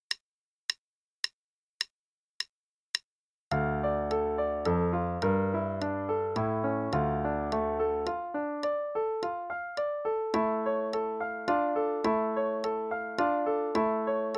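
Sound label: Play-a-long, Scherzo in d minor, eighth note=108; Gurlitt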